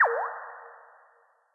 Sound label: water